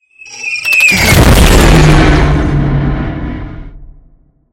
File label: scifi passby whoosh